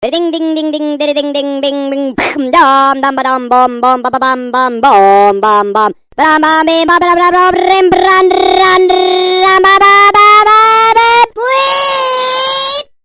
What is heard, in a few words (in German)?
Theme